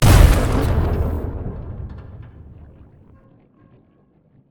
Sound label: railgun, Weapons; Barotrauma; Subsurface